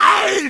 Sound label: pain, zombie; npc; cstrike